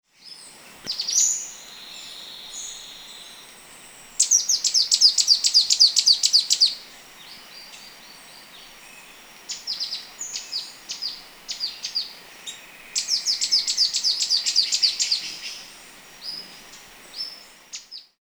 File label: zidedê do nordeste Terenura sicki